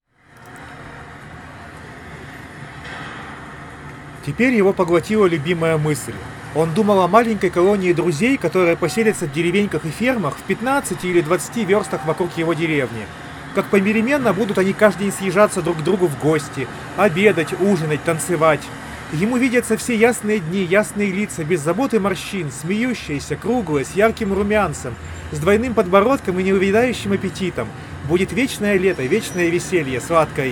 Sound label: Recorder noise; xiaomi bluetooth speaker asm02g